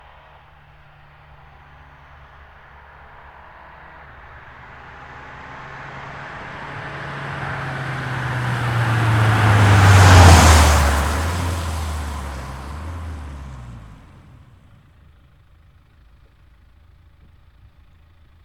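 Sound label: Mini Countryman F60 SD - Exterior WET - approach passby front to back; Soundholder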